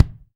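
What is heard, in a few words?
BD, bass drum; Best Service Gigapack 2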